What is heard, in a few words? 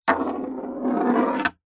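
marble roll across hollow wood short; zapsplat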